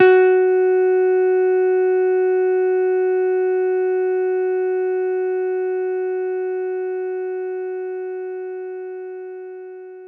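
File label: Jazz Guitar F sharp 2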